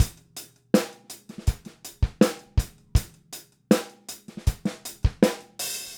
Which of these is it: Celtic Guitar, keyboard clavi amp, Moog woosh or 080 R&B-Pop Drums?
080 R&B-Pop Drums